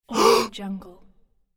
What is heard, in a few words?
holding breath gasp